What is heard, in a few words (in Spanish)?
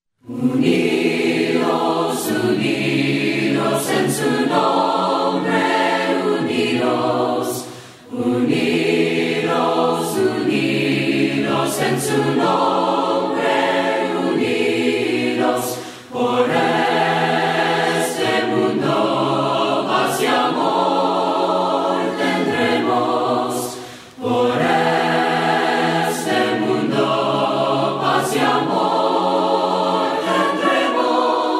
VOCES, himnos a capella; UNIDOS; cantos del camino